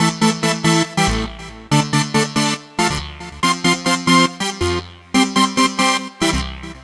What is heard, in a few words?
TECHNO SYNTH 140; Keys